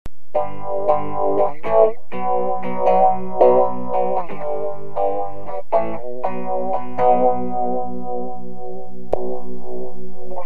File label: phaser and other